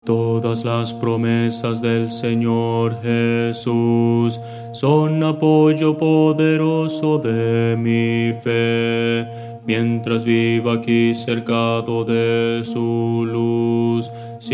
bajo

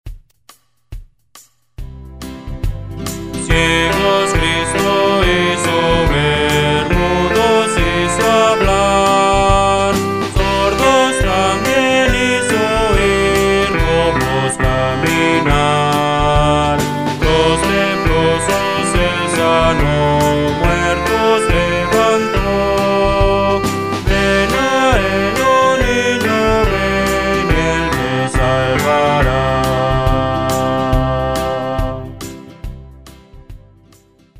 Ciegos Cristo hizo ver, Himnos Cristianos

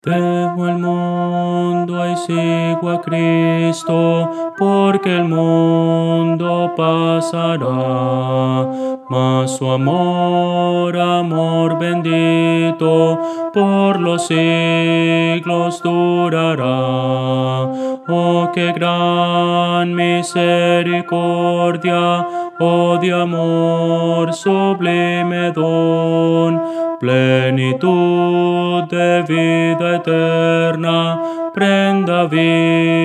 contralto

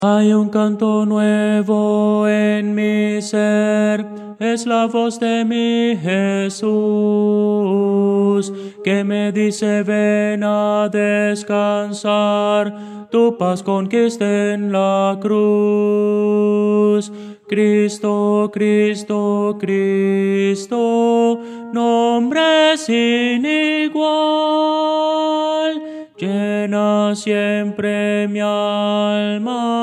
tenor; Voces para coro

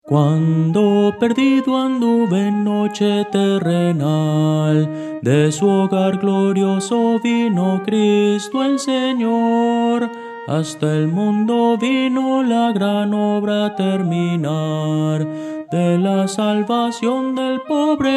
soprano, himnos cristianos; Voces para coro

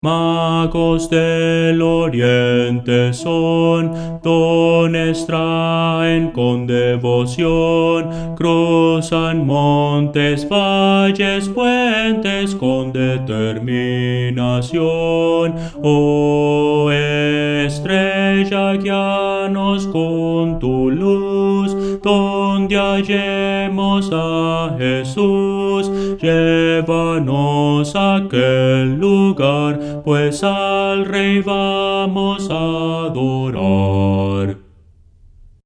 bajo; Voces para coro

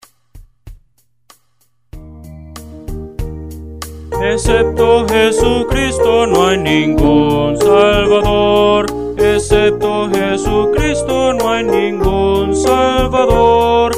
Excepto Jesucristo, Himnos Cristianos

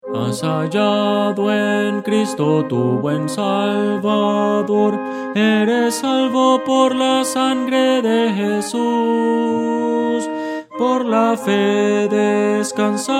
melodia; Voces para coro

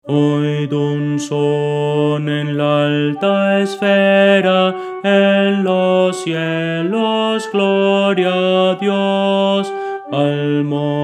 oid un son en la alta esfera contralto

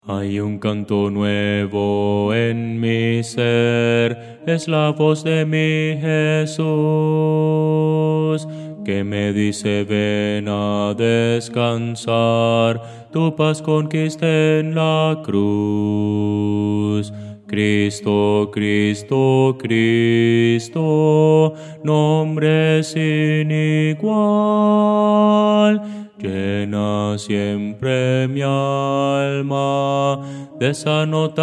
bajo

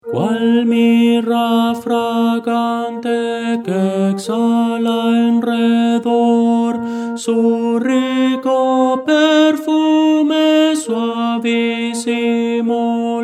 tenor; Voces para coro

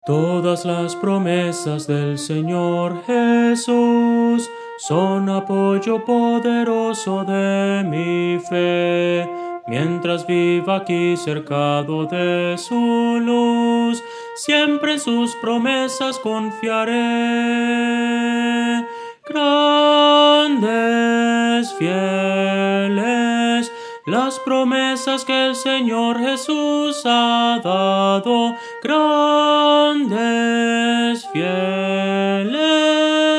soprano

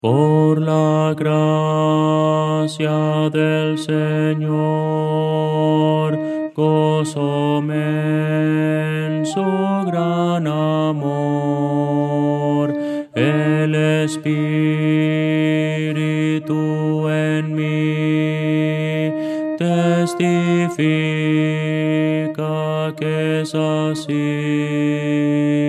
contralto, himnos cristianos

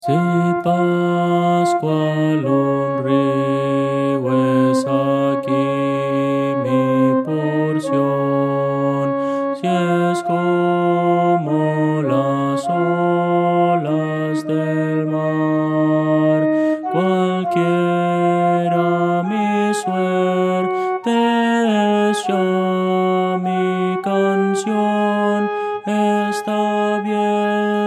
contralto

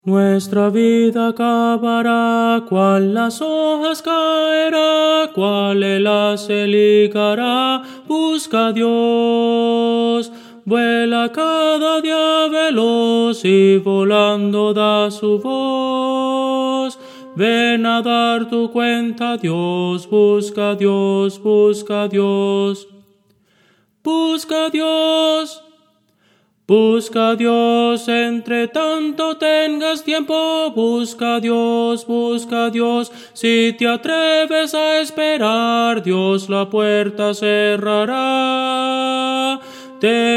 tenor, himnos cristianos

